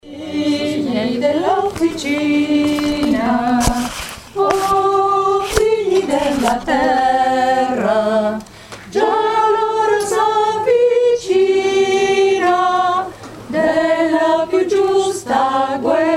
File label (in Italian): Figli dell officina soprane